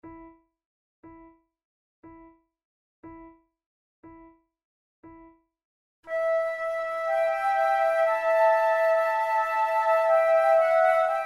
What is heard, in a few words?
flute sonata adagio; mattheson